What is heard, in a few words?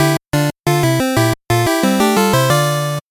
8bit jingle normal